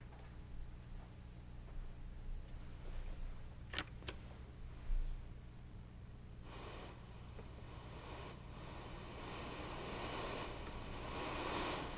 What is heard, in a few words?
Radio Noise